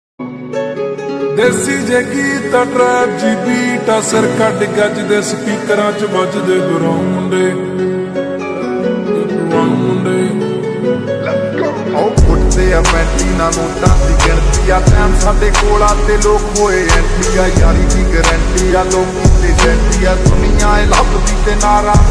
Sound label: slowed ringtone